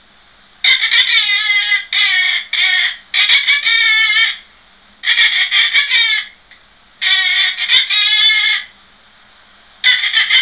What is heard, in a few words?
birdsing